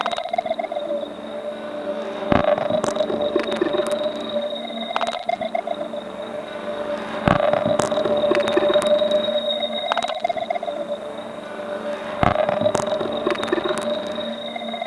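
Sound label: cassette click; cass click world; Polyend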